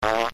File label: Fart; Oddworld Abe